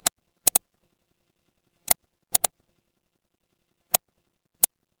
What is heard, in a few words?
office mouse click